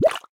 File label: Zombie Peek